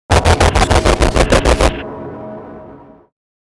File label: Demonic Voices Glitch Hiss Chopper; Production Element Imaging Element Accent Transition; Evil Voice; 5.1 STEREO MIX; Blastwave